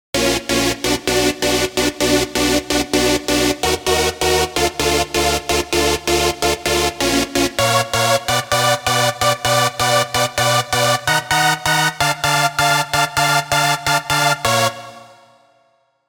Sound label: Stab; Stab Mind Circles; Revealed Serum 2 Rave Asylum